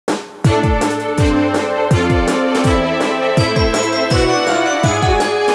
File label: Orchestral Intro; Imagine Modern Dancer; Nintendo DS